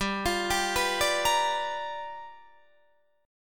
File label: G 7th Sharp 9th G chord, Guitar Open D